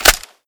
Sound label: mag in, weapons